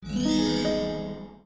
recipe Unlock; roguelands

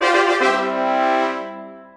Popup Win; tiny gladiators android ios mobile switch gamerip 2017

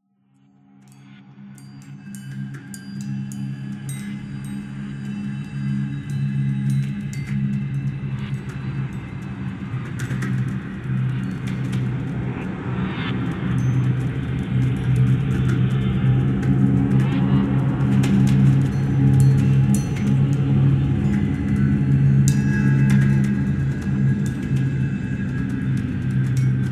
Bells Ambient

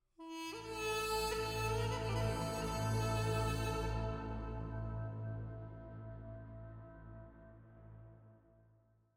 Combat Lite Mood

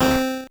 level squish C; earthnight

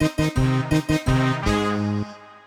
end stinger; SpongeBob Patty Pursuit

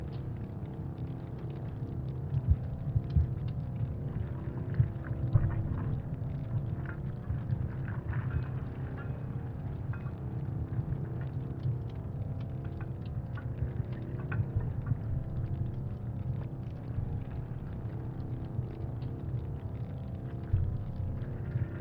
VS screen loop

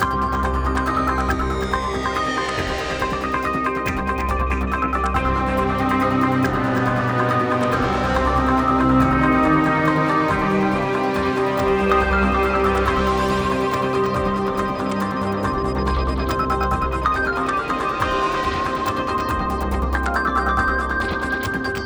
Enemy Turn

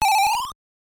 Weapon capsule; Gradius; MSX

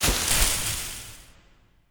Tap

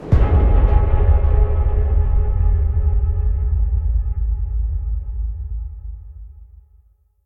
stinger

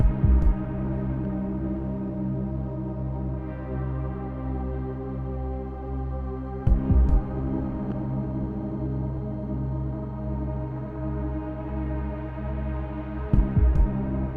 Exploration, 72BPM

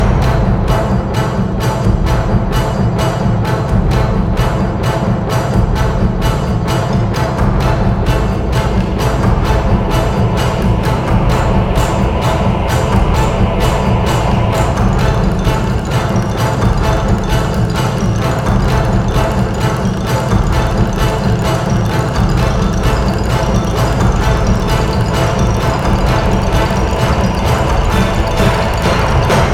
Caves Loop; Jotun

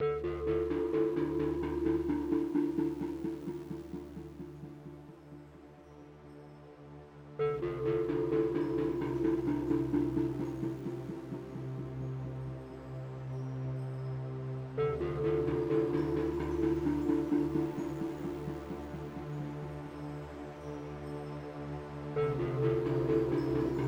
Music Exploration; Last Encounter

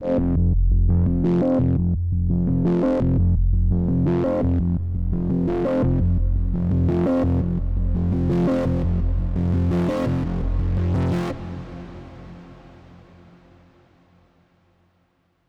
Flytrap Overlay Dark

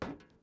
metal step

